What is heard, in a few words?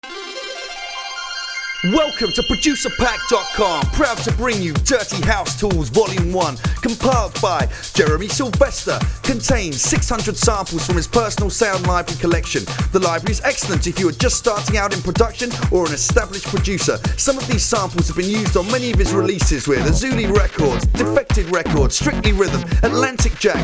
house; dirty house tools